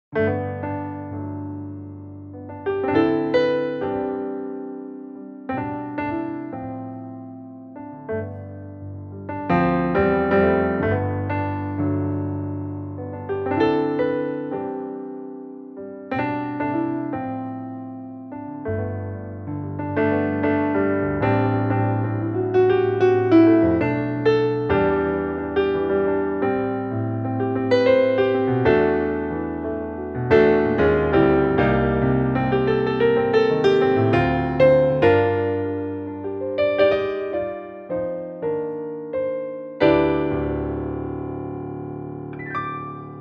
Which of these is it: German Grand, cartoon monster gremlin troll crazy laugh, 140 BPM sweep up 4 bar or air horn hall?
German Grand